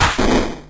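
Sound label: Battle Appear Foe; Etrian Odyssey III HD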